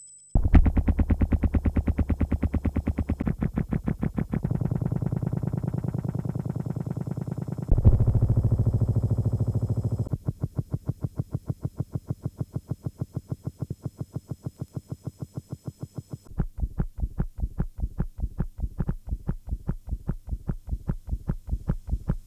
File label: beat